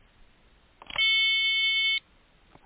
Machine Dying Short

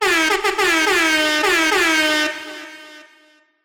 Air Horn; Fortnite; emotes chapter 1

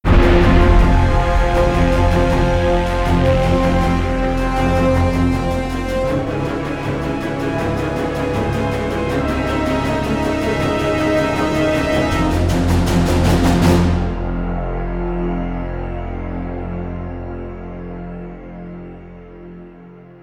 String; The Bureau XCOM Declassified

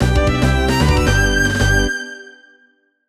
Stage clear; donkey kong country 2 fan remastered

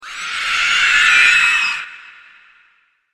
Necro scream; criminality

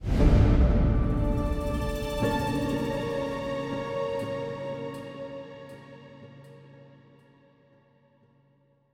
Stingers sting risk

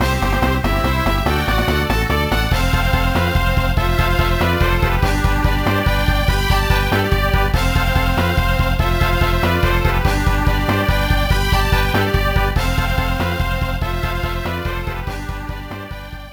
Win Match; mario tennis power tour

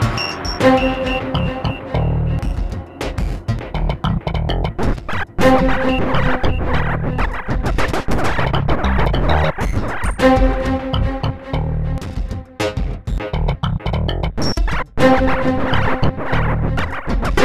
Funk; Death Jr. and the Science Fair of Doom; Nintendo DS; Spirit Toy Funk

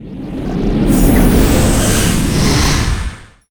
ship Turn

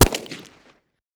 Shotgun Shot; gamerip; shelter 69

